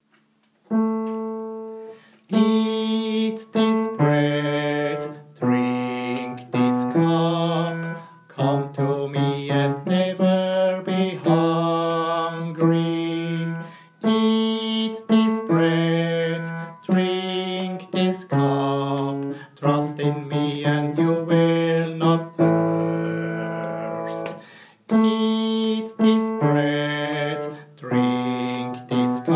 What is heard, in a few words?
Bass